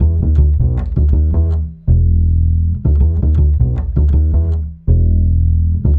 Acoustic Bass

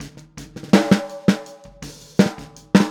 Boogaloo, Snare, 82 BPM; Drums; Verse; Sonic Foundry; Drums from the Big Room The Mixes

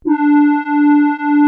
SQUASAR D3, LEAD; Music Store - Claudius Diemeter Schwerlos

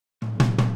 Boogaloo - Full Mix, 82 BPM; Drums; Intro; Sonic Foundry; Drums from the Big Room The Mixes

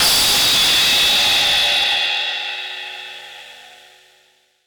FX Crash, Drums Acoustic; USB Soundscan vol.10; AKAI; 90s sample CD

